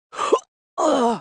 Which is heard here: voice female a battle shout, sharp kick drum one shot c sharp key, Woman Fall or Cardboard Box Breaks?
Woman Fall